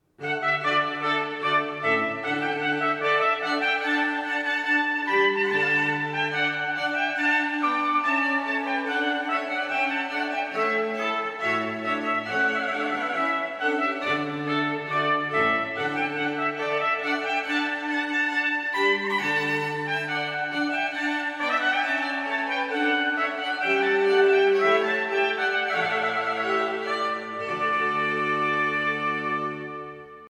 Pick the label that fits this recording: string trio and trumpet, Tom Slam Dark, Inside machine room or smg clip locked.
string trio and trumpet